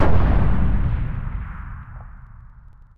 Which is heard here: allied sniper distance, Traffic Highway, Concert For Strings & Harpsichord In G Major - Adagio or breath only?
allied sniper distance